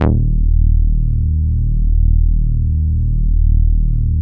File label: Moog Taurus, EB1; Big Fish Audio - First Call Vintage Keyboards